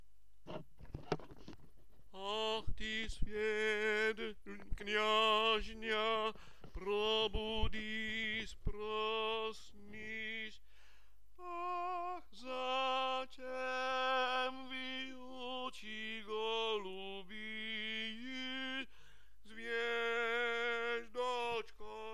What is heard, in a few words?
Tenor